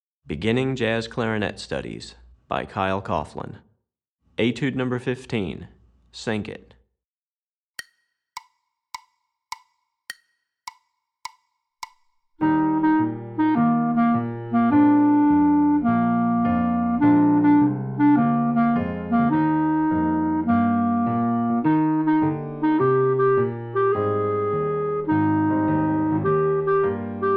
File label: Etude, Jazz Clarinet; Etude #15; Recordings For Beginning Jazz Clarinet Studies, Volume 2; Syncopation; Kyle Coughlin